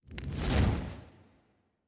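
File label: Cult Fire swoosh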